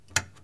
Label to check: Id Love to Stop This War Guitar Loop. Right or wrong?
Wrong